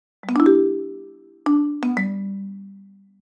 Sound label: Marimba Gliss Up Down Flourish Slow; The Chris Alan; Gliss Bliss